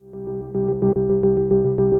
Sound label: Piano, piano & keys; Piano Quartz; Ableton; Factory Packs; Granulator III